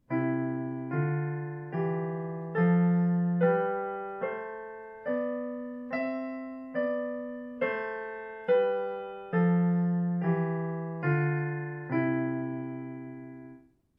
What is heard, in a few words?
Scales in thirds